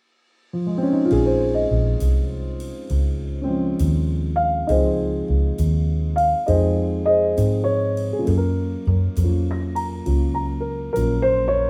jazz summer cozy relaxing romance

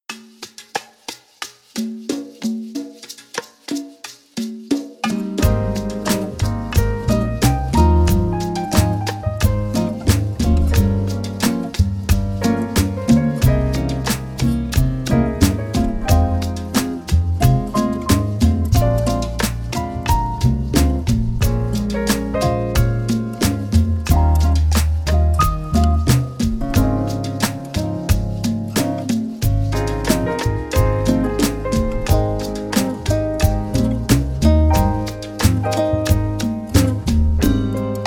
start slowly, jazz

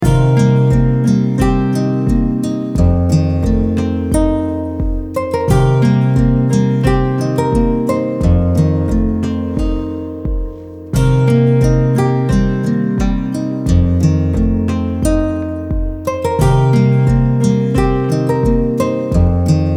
calm nature guitar serenity loop, folk

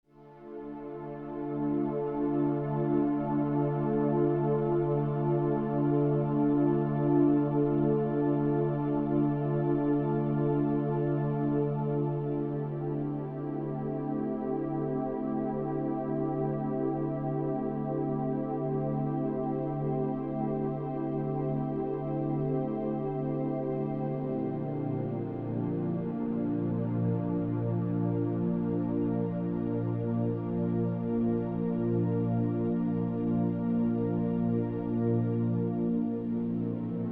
relax music

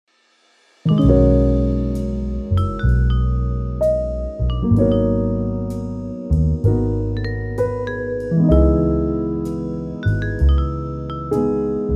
relaxing jazz music cozy soothing vibes

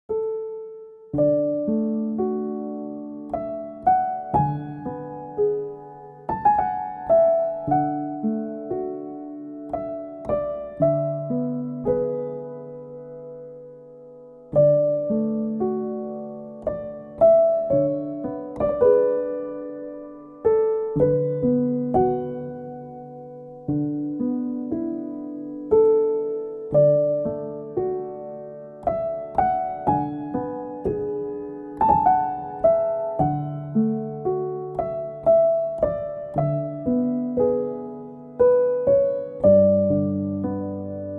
relaxing piano music

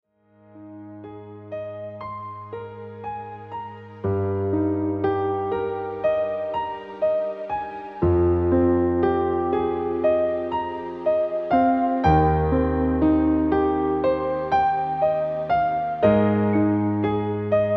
piano background music, classical

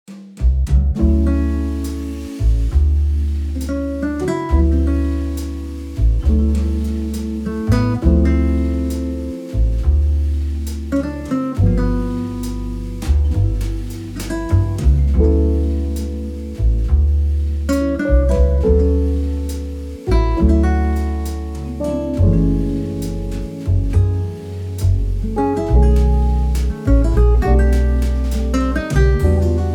mellow smooth jazz relaxing acoustic guitar

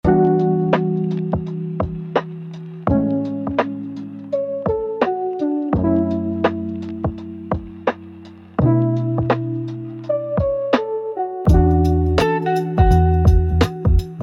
sunbeams through the blinds chill lofi

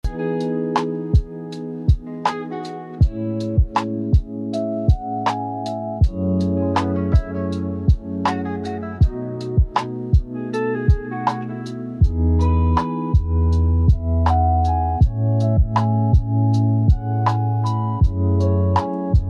warm socks lofi music chill lofi

